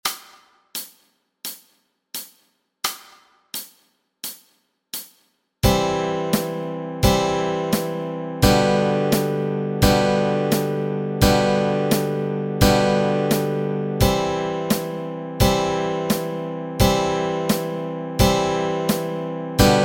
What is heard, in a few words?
Wise Man Built His House Upon the Rock chords, Easy Guitar and Ukulele